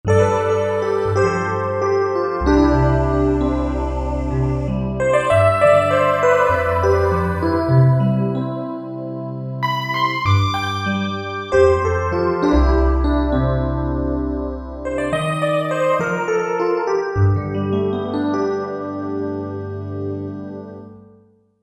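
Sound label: E.P Voice; Korg MSC-1S Variety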